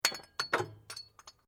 Metal Light Movement Minor; Materials

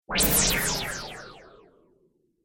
Laser Swish Delay, whoosh sound effect

